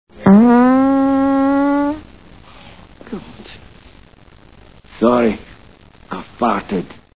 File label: farted